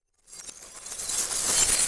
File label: fx cinema